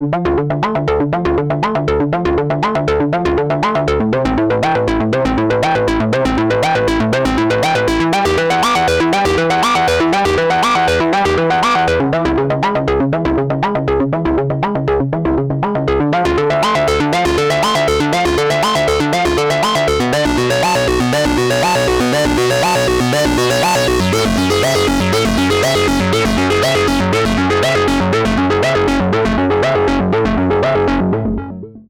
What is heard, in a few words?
Dance Synth Wet; dist tube culture